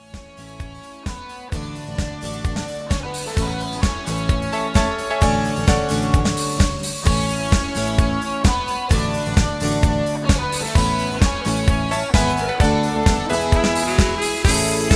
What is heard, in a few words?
Instrumental